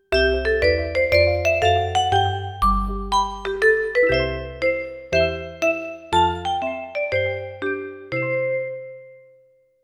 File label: vibraloop; bij de boswachter afl 4; ernst bobbie en de rest